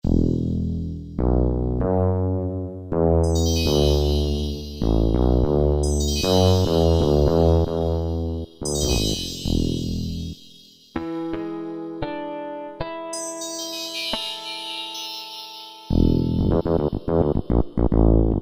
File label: Bell Tree; Kurzweil K2000 V3; Factory Presets; Jaco-Bell Tree